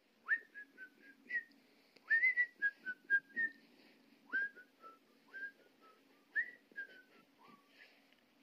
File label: whistling; Whistler Report